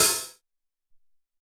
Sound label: Mid Hat, Alesis SR-18